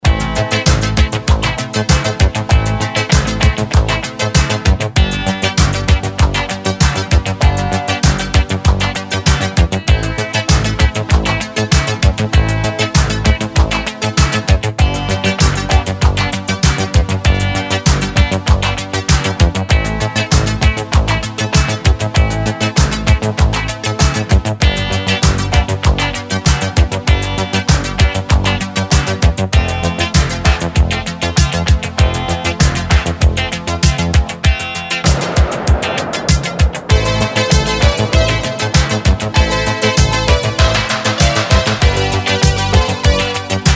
Instrumental Version; Italo

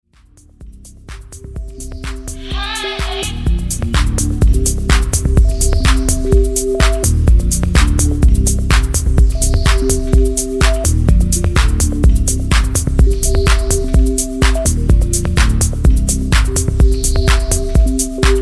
night chime; Disco

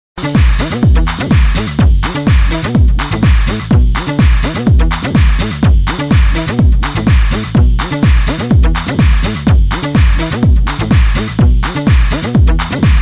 Bass Line; Detroit

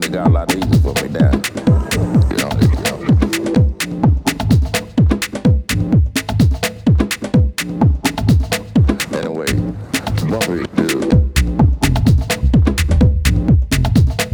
Chord Story; Tech House

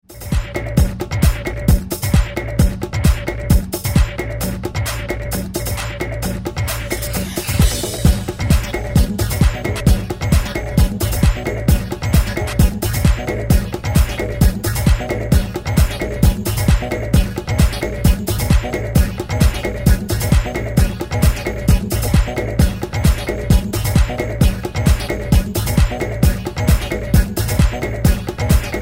sines

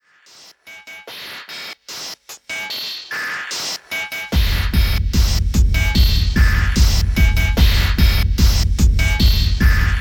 FM Bells; RX-101

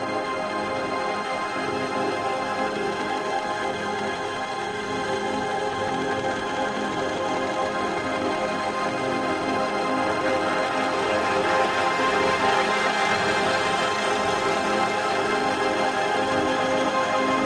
ambient version

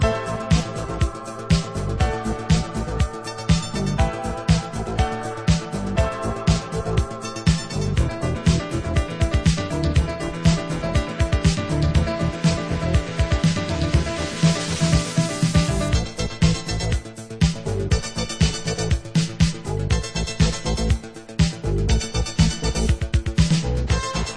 Instrumental

